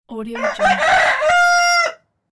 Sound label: rooster